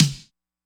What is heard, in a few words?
snare; pasific; Pop